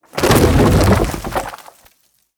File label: impacts; World of Tanks